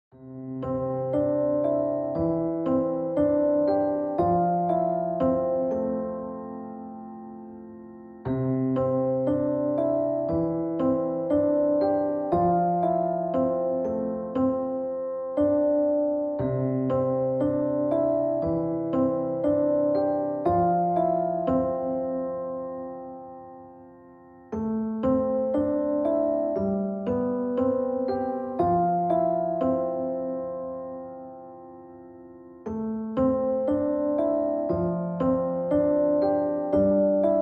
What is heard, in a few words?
Piano Relaxation